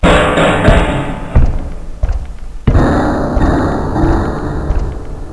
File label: heavy move